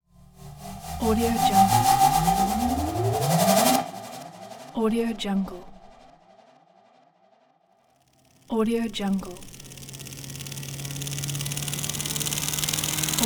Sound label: whoosh rises